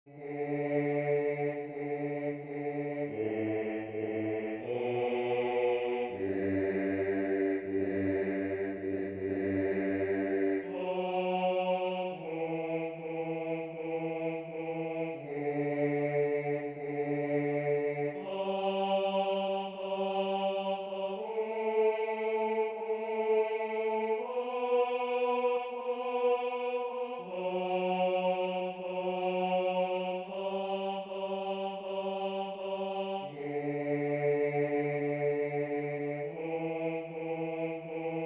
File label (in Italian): Basso; Chi ci separer